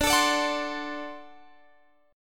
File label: D 7th